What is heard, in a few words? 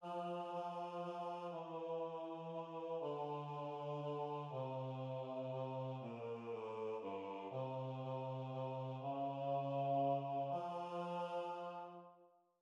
Give Me Your Hand Bass